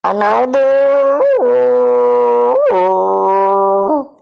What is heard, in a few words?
uooo uoooo uooo